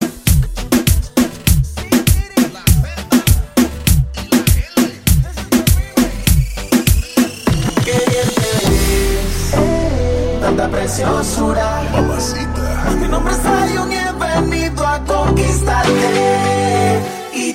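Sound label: Remix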